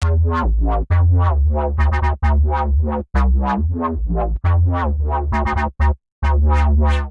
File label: Reese Wobble D Minor 135 bpm, bassline; MIDI Clips; Tonal; Skitter and Step; Ableton Factory Packs